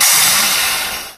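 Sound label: emergency brake, train, class 66; OpenBVE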